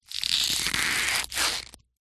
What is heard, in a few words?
food drink ice cubes in rubber mold bend stress loosen; zapsplat